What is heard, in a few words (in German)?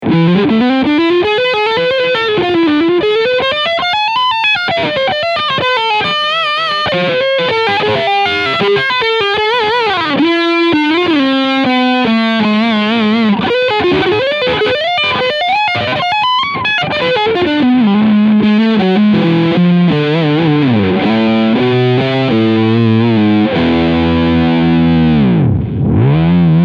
Lead Steg PU